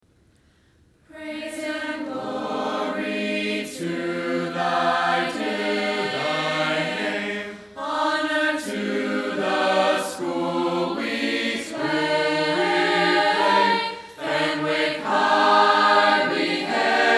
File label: Alma Mater Vocal